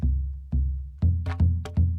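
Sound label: Calm Far, music; From Dust; PS3; Xbox 360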